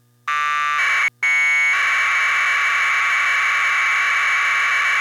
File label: VHF PKT